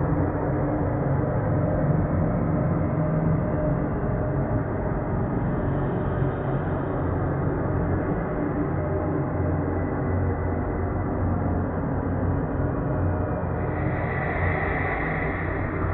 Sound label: ambience cave; The Swapper